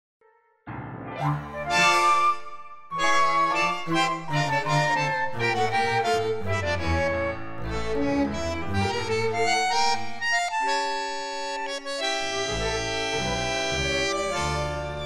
Dm play flute referencia

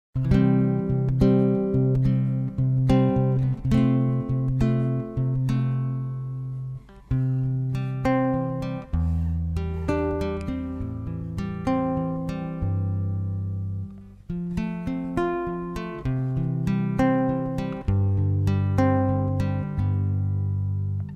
cover C

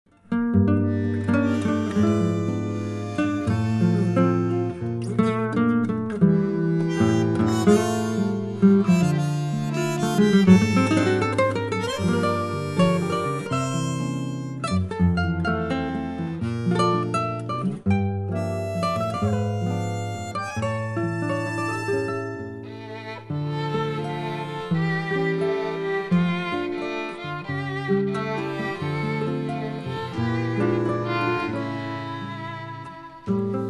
Dm play referencia violin

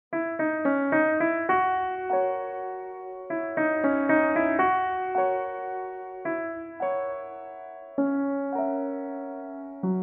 piano play flute; 2nd flute

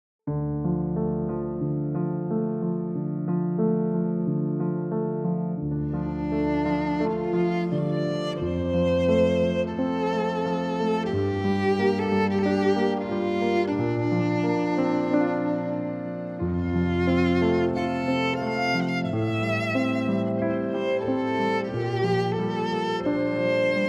play Violin reference; Kung fu panda

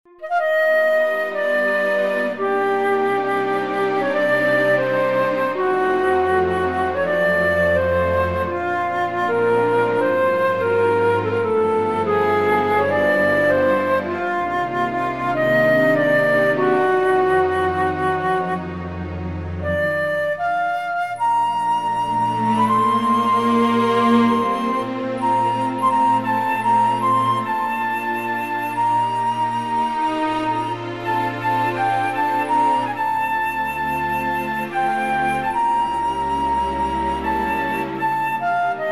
play flute reference

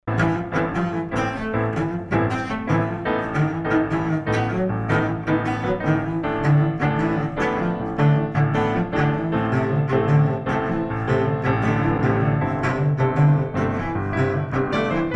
piano y cello backing track

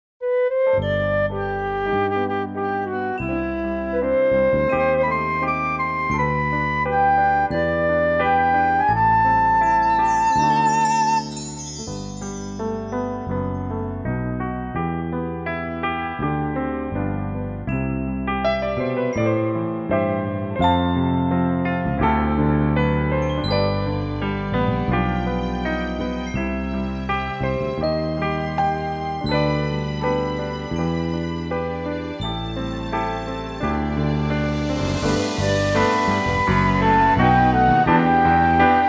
G y Ab

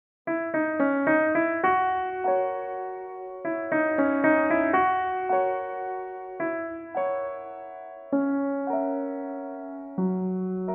piano play 1st flute reference

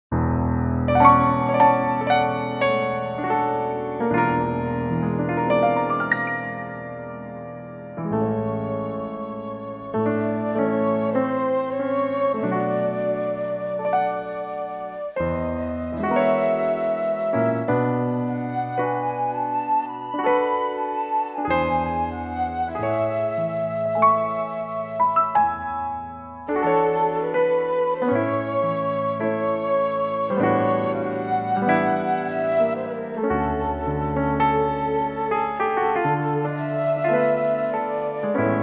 A play reference flute

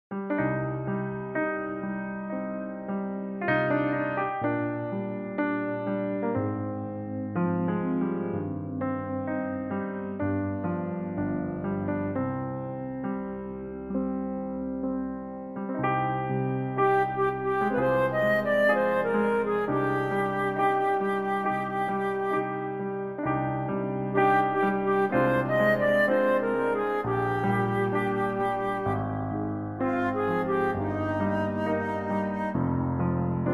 play flute reference, Cm

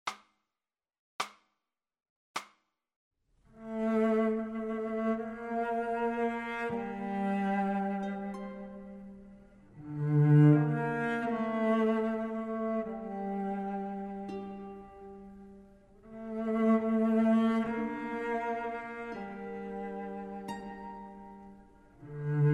F play reference cello

